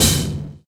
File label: Hi Hat; Sonic Foundry (Sony Creative Software); Drums & Drones; Construction Kits; Pace Kit